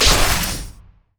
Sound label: Shield; orianna